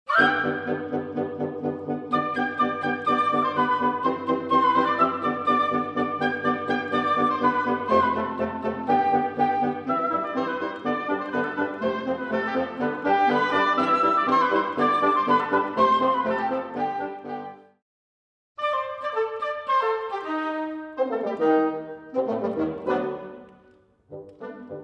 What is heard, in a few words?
Corporate Mix